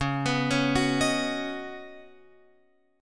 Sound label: C sharp 9th Suspended 4th C sharp down Guitar Open F, chord; C#9sus4; arpeggiated